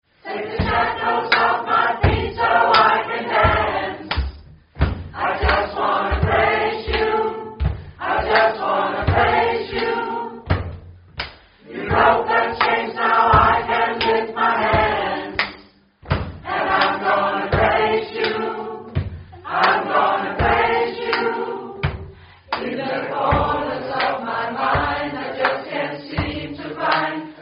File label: acapella